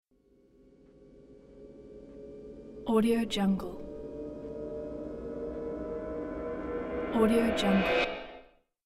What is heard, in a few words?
cinematic sound design